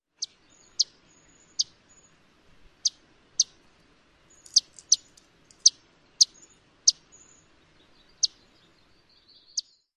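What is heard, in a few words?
alarm chips, Grace's Warbler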